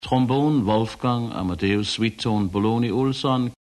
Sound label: trombone